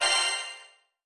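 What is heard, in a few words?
button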